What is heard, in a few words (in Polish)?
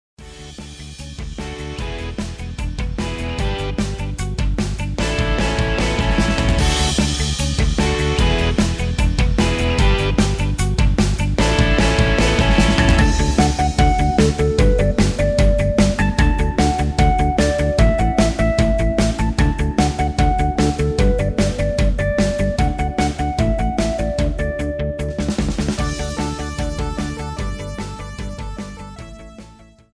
Balonik w gore wersja instrumentalna